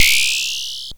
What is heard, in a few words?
blips, opl3